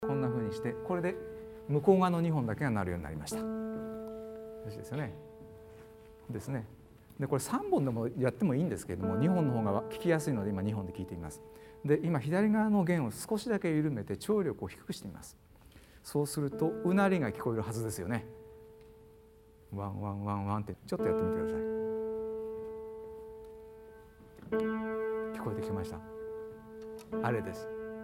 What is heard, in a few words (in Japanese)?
うなり ピアノ 弦2本